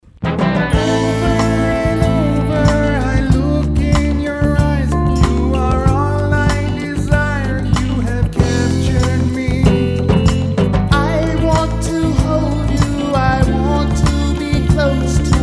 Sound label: Ballad Medley